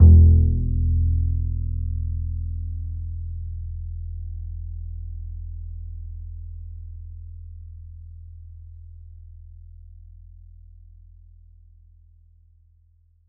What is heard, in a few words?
E, E string upright bass; Larry Seyer